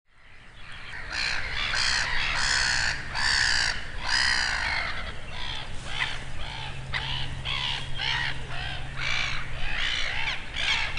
naurulokki; Kirkuvat ja rääkyvät – ei niinkään nauravat – äänet aivan erilaisia kuin muilla lokeilla; birdlife

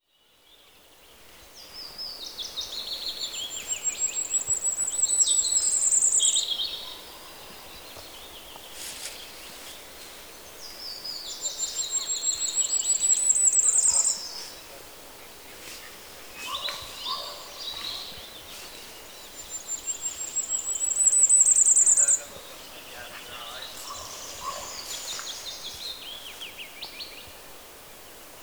Tulipaahippiainen laulu; tulipäähippiäisen laulua; Kirkkonummi 5.5.2018; Eetu Paljakka

